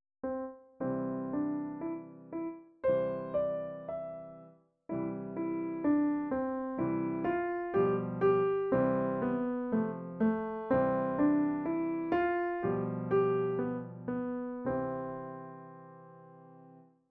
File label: Piano; I Love Piano